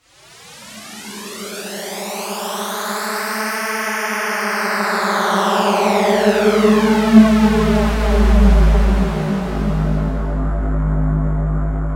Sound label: Weird Rotor